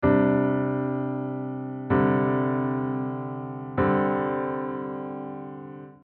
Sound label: 5th to 4th chord